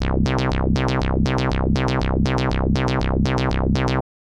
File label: bass